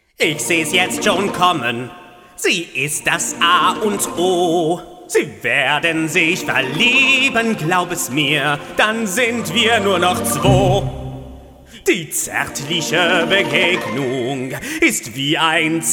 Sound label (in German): GESANG CARTOON; Timon